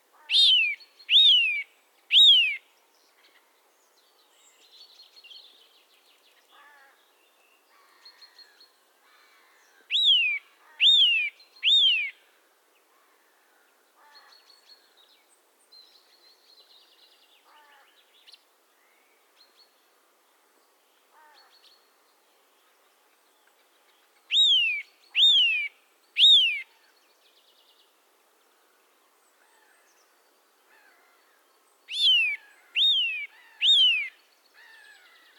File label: Sitta europaea; SETE